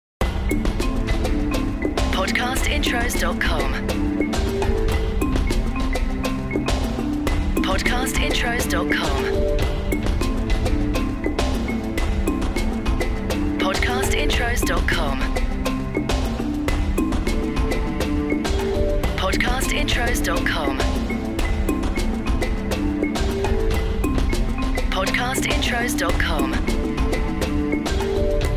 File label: Podcast Watermark WORLD Big Cat Safari